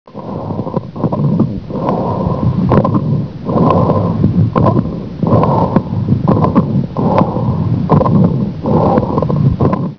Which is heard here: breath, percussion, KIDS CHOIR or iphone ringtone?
breath